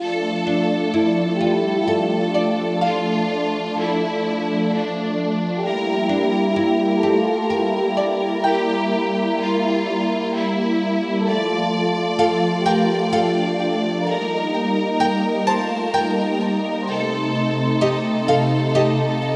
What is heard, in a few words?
music for relaxation; verdi